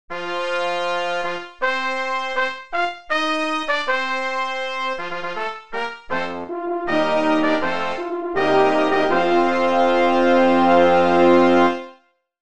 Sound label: Fanfare